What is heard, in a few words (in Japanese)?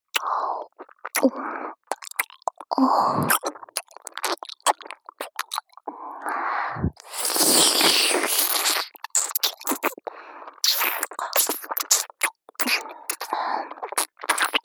妹の両耳舐め, ASMR; ループ